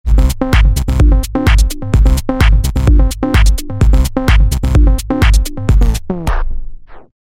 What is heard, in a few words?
Loop Brake